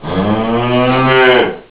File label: cow